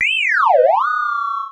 Sci fi

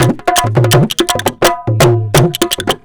DHOLAK, Indian Traditions; USB Soundscan vol.45; AKAI

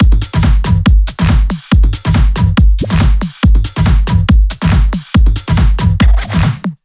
Break Beats, 140 BPM

